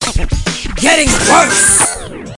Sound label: Getting Worse